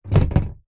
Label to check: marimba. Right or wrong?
Wrong